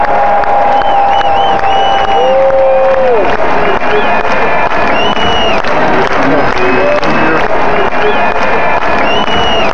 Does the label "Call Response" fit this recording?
No